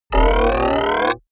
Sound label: starting device go up